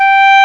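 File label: woodwind G3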